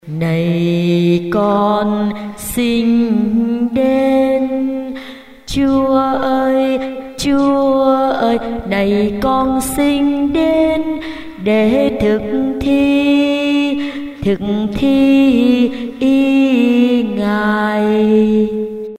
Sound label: alto basso